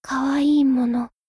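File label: attack; hina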